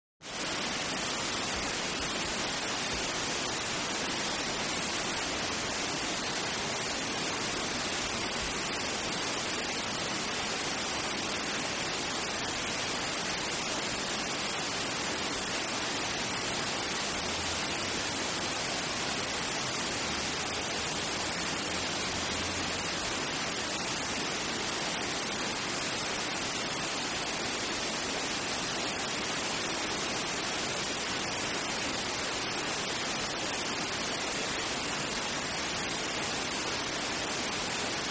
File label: Fountain Closeup